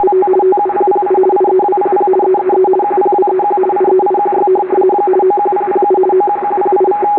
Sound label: rtty